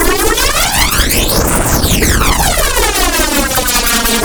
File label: Prime Light Glaive Loop; Warframe